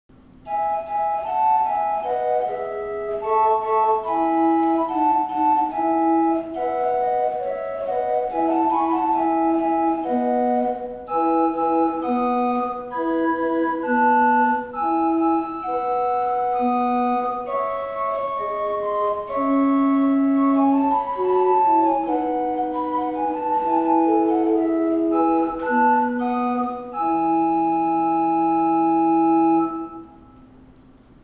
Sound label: Flauta 4, Tannenberg Organ; Gemeinhaus Saal - Old Salem, North Carolina